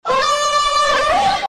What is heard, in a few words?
Autotune